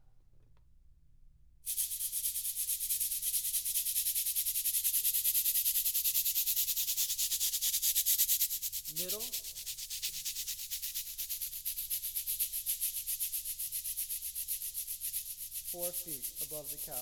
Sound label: Height Test